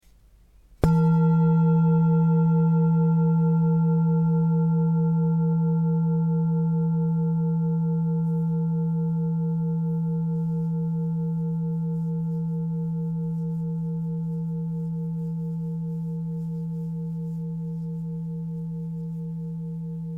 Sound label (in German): Klangschale